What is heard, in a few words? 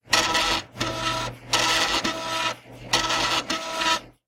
Floppy Drive